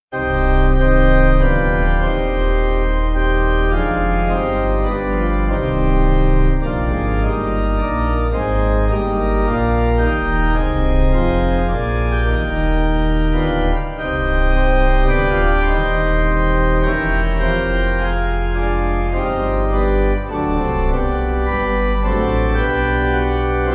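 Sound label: Pipe, Pipe Organ; Leader Of Faithful; Mt Beacon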